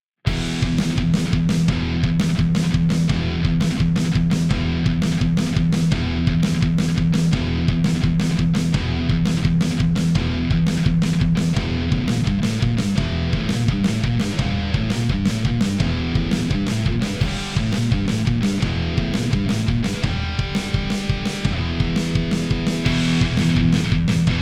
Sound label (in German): Vergleich Rectifier